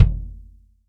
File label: FBD MF, FUSION